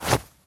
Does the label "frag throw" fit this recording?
Yes